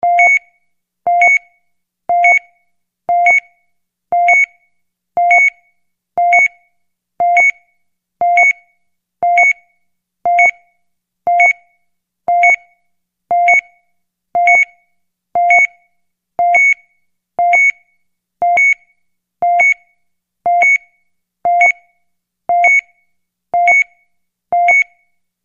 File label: Heart Monitor; Regular Repeating Double Medium / High Pitched Beep / Into Frantic High Pitch Beep To Solid Flat line Beep, Close Perspective. Hospital.